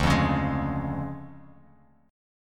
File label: D Minor Major 7th Flat 5th, D, down, Guitar Open F; DmM7b5 strummed